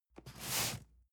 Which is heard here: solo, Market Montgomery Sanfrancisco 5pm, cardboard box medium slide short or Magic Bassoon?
cardboard box medium slide short